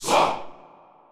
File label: win test; netent